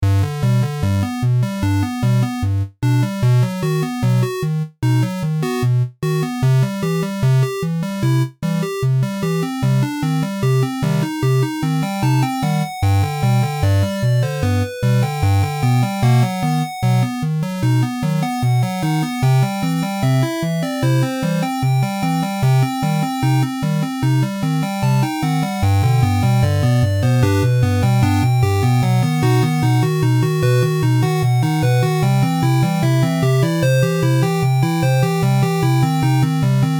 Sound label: 8bit creep